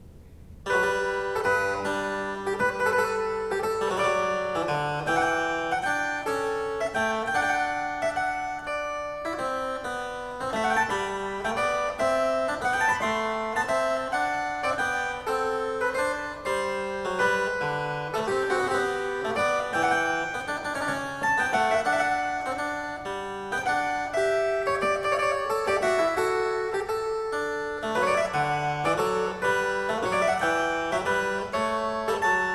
Cembalo; Variatio 7 a 1 ovvero 2 Clav Al tempo di Giga; Bach; Goldberg Variations

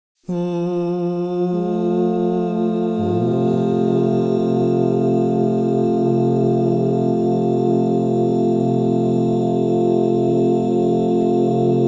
Fa major, crystal bowls; Yoga and sound

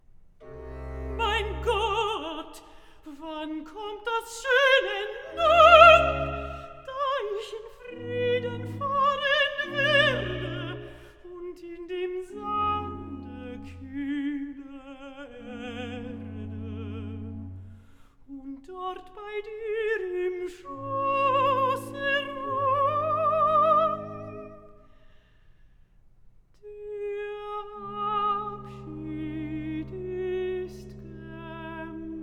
Recitative, cantatas; BACH; Ich habe genug

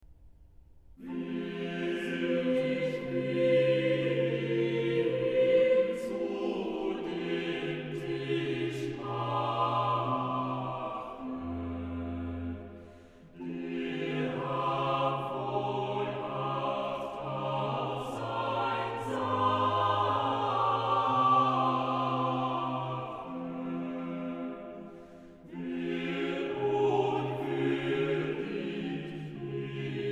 Chorale, ORGAN; BWV0363; Wer sich will zu dem Tisch machen; BACH; BACH ORGAN WORKS; CHORALE PRELUDES

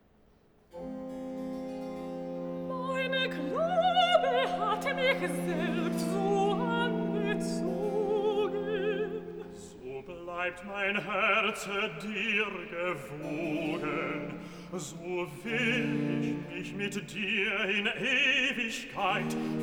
Recitativo Dialogo (Sopran Bass); BACH VOCAL; CANTATAS; BWV049; Ich geh und suche mit Verlangen

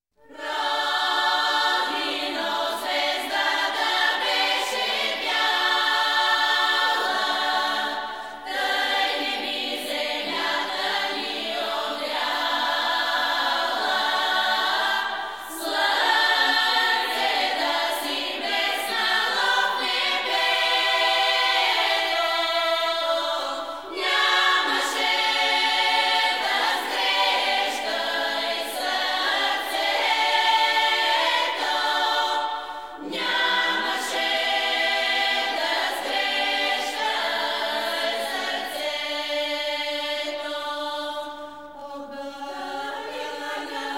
Bulgarian Female Choir; WORLD MUSIC

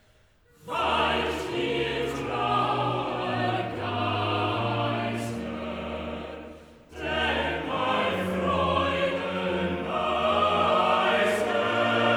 Motet; Weicht ihr Trauergeister; Bach; BWV 227; Jesu, meine Freude

